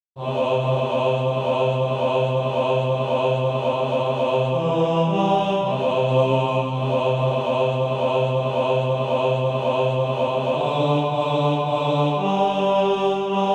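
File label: bass, Practise Music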